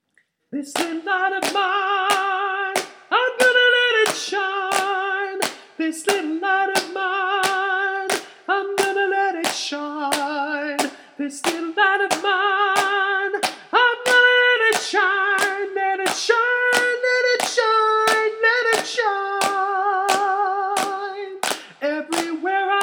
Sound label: This Little Light Of Mine Soprano